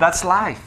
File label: death